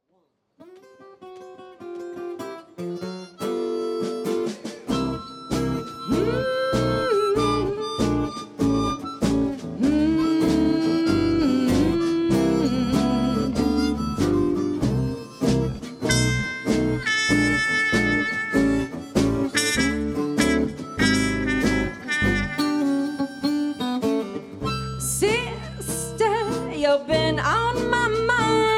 Blues

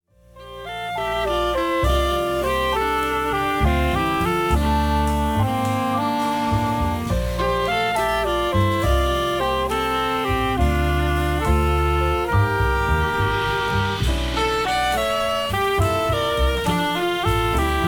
Piccolo

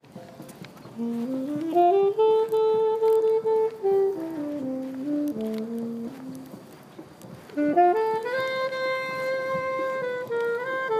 Late Night Saxophonist Practising in Yoyogi Park

